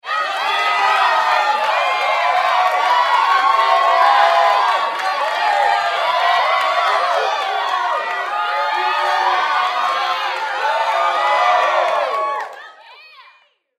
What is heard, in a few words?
Human Crowd Studio; Crowd Reactions Scream Go Team Cheer Clap Long; Screaming; Blastwave